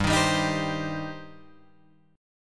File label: G Suspended 4th Sharp 5th, Guitar Open F; strummed; 7,10,7,10,10,7